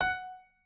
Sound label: piano